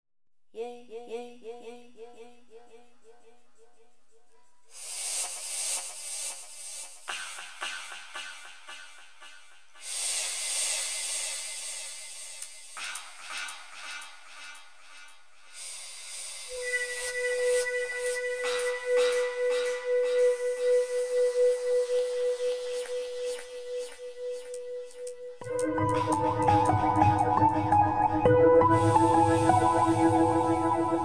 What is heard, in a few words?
Drums